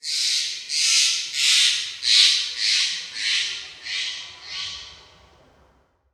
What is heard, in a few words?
ambient bird